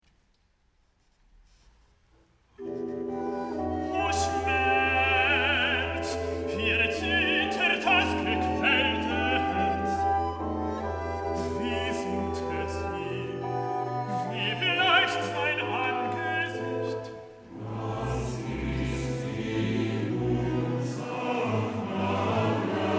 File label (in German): O Schmerz Madrigalchor und Tenor Solo; Wetzikon